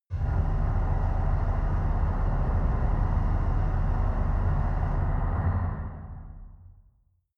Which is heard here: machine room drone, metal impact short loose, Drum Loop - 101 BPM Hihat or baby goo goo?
machine room drone